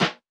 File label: snare; bespoke - Floral v.1; drum oneshots